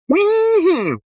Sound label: Mm Hmm, Exclamation, Male; Exclamation Sound Effects